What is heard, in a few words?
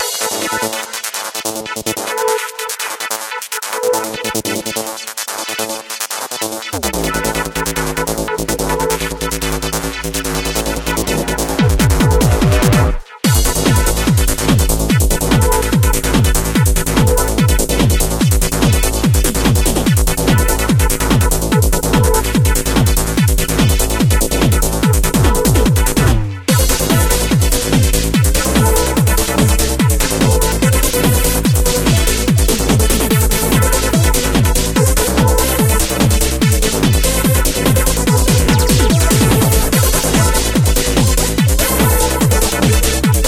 Docks Night; Mini Motor Racing; Android